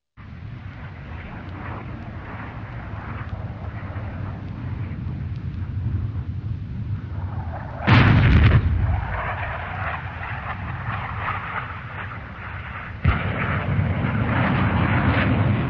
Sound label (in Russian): Bomby sbrasyvaet